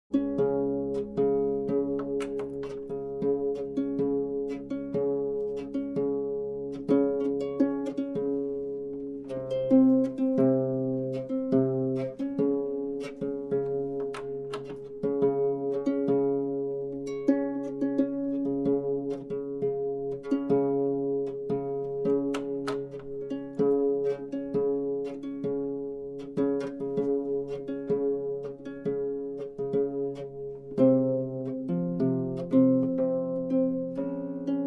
Tuning the Harp

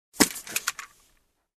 Pile of clothes with hangers being put down

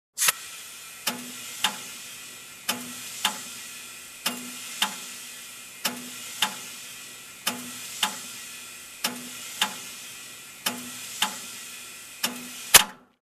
Machine Turned on with steel noise banging

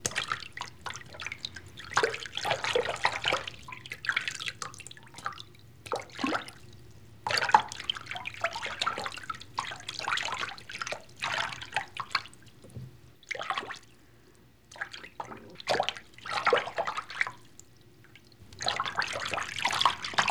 Bath Water Movement

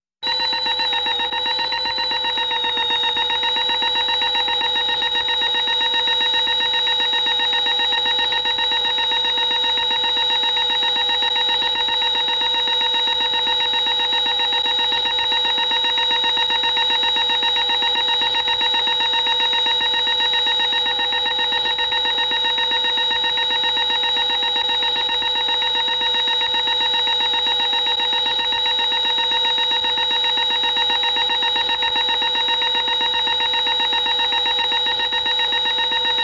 Horrific UFO Beep

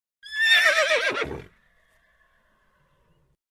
Horse Whinny

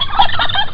clucking turkey

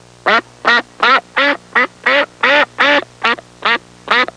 Consecutive duck quacking

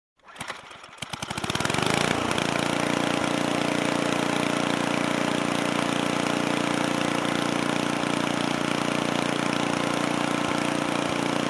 Hedge trimmer motor sound

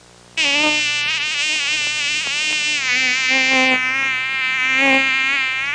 Mosquito sound moving away

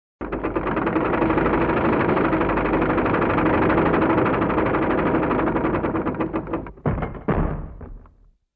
Heavy Bevel Gears rolling with bang

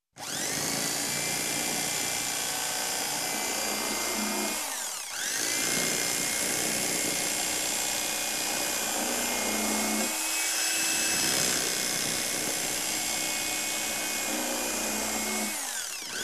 Electric Hand Mixer

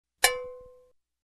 Cheap Bell Ring

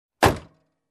Closing Car Door

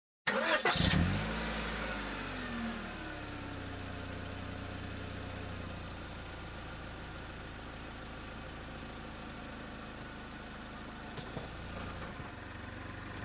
Car engine ignition and reversing

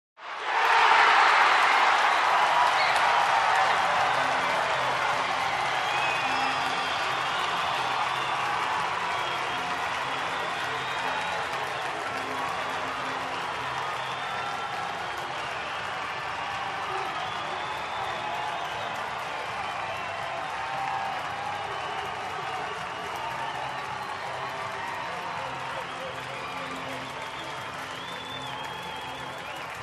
Crowd celebrating and cheering

